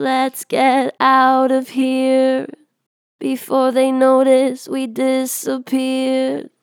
Str Vib Lets get outta here, Vocals, Natural Vibrato Strong; Harmor Vocals